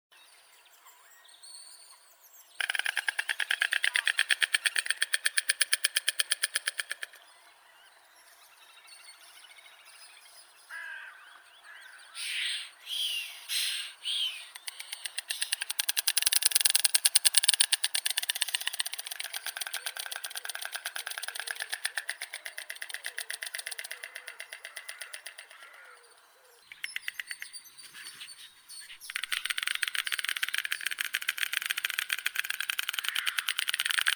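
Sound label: bird voices, white stork, Ciconia ciconia; aist belii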